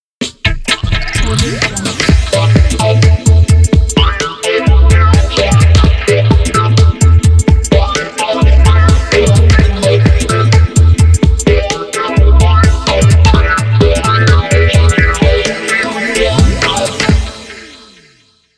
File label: dub reggae intro logo